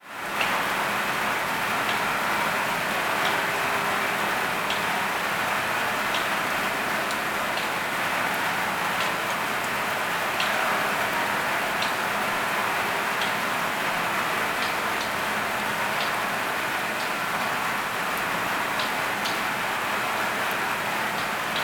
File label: Rain on Metall Big Plate